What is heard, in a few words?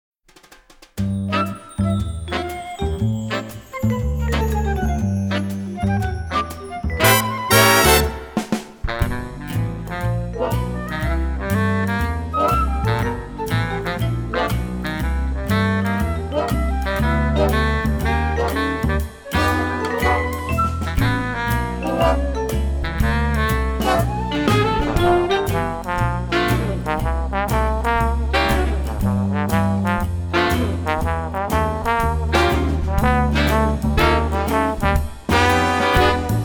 instrumental